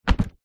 foley container tub plastic set down concrete light grit; zapsplat